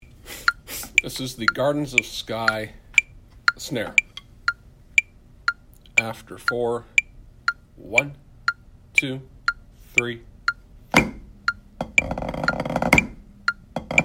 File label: Snare; Gardens of Skye